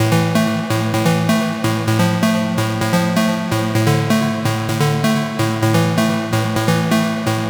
Melodic Loop Feedback Amin 128, Melodic Techno; Ghosthack; AC2024 - Day 12 - Melodic Techno

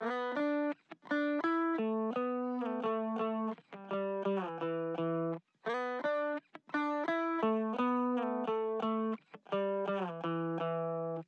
170 BPM G Maj Lead, Guitar; Loop Stems

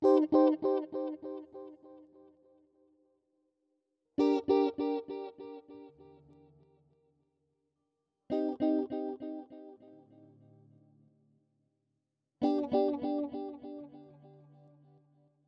116 BPM C Min Guitar; Loop Stems; Cymatics; Encantadora

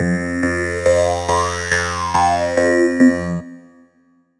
Riddim Melodic Hit - F Sluggish; One-Shots; Ghosthack; Riddim Essentials Volume 2

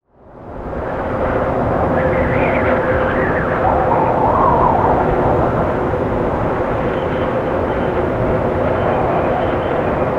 Wind

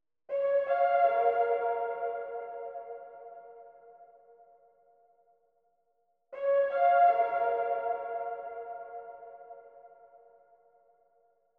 159 BPM D# Min Lead; Melody; Loop Stems; Cymatics; 2022 Melody Collection Platinum Expansion; Sky Blue

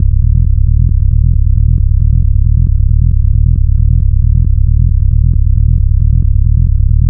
Bass Loop C 135, Techno; Ghosthack; AC2024 - Day 1 - Techno Sounds 2025

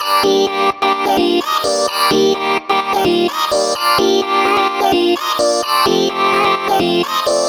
House Vocoder Loop 128 BPM G Min; Vocals, Chop Loops; Cymatics

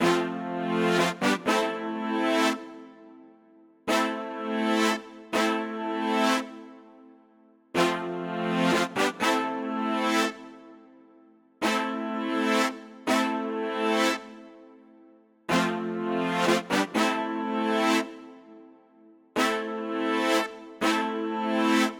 124 BPM A Min Brass; Trap Melody

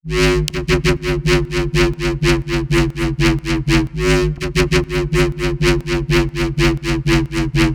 G House Drop Loop - 124 BPM G# Min; Synths; Loops; Cymatics; EDM Starter Pack

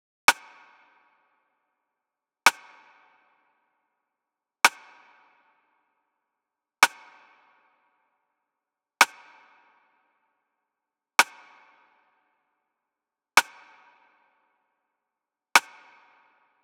Drum Loop, 110 BPM Rim; Cymatics; Vibes II Drum Loop Collection; Avenue Drum Loop

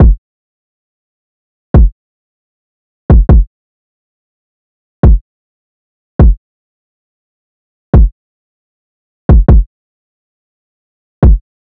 Drum Loop, 155 BPM Kick, Trap; Loop Stems; Cymatics; GHOST (Beta Pack); Ambush Drum Loop

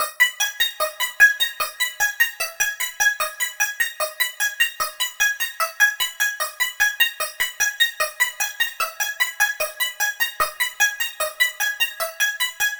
Arp Loop - 150 BPM D# min; Dubstep; Arp Loops; Synths; Raptor; Cymatics; Dubstep Starter Pack

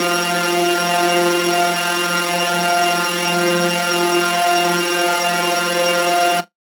Lead Shot F Ring, Dubstep; One Shots; Ghosthack; Dubstep Sound Arsenal

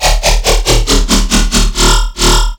Riddim Bass Hit D#

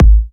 Kick, House Drum One-Shots

